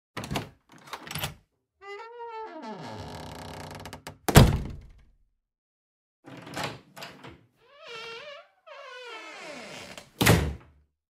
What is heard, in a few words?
door hut